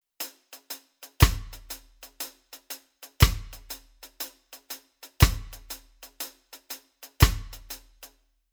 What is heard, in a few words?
Rhythm Reggae